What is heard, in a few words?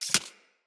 Arachnoid Micro Step Snow; Corpus